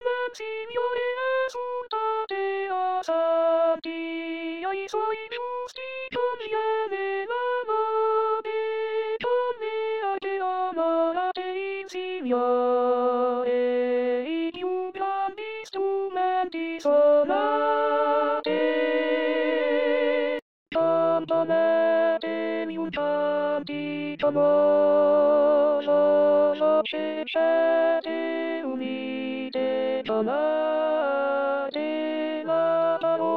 Nel Signore Esultate Contralti

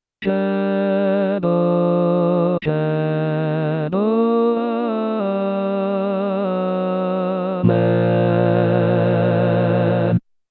Credo Rit Bassi; anno liturgico

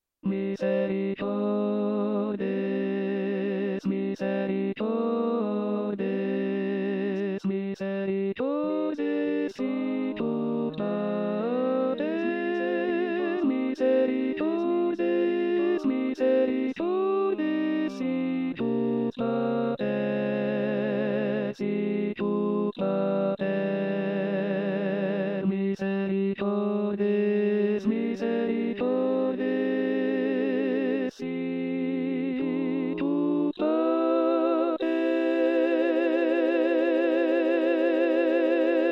misericordes finale soprani; anno liturgico

